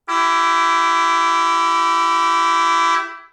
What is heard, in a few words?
Marine Horn